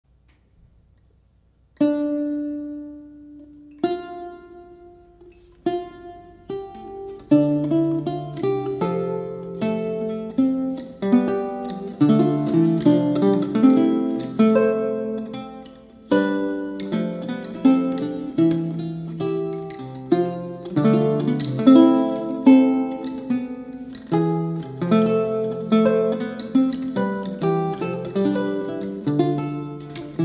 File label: Trio, Lute; Julien Belin